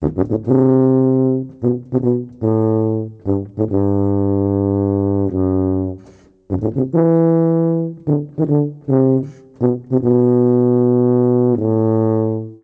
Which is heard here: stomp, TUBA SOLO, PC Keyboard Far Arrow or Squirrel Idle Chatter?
TUBA SOLO